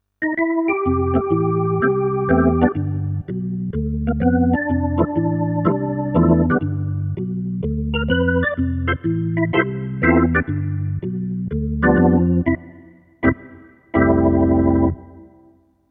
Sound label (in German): Casio CDP-S360 Jazz Orgel